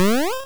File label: Meditate